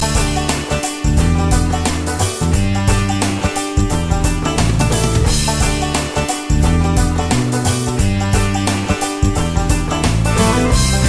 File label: Banjo; Scrubs